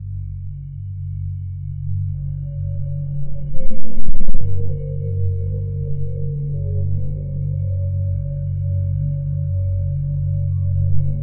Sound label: an intimate sonic logo; branding